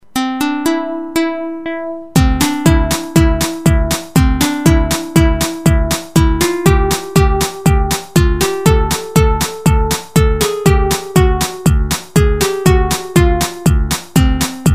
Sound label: Techno song